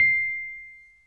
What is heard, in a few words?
RHODES EP2 C6; Sonic Reality; Vintage Timetraveler